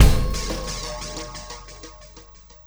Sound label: Ripple Perc; Ripples; Sonic Foundry (Sony Creative Software); Processed Drumkits Zero Gravity Beats